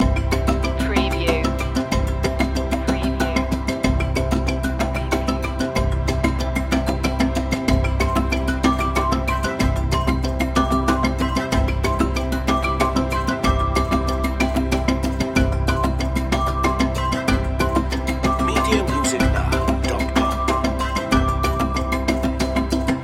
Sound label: african spirit loop